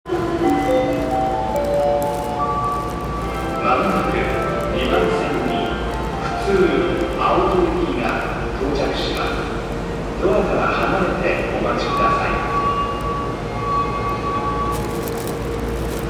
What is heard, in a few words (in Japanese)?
浅草線本所吾妻橋東接近, train sounds; 都営; 都営浅草線本所吾妻橋東接近